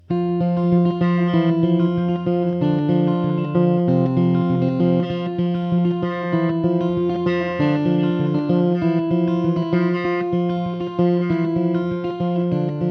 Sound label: Feathers, Cleaning, Chiming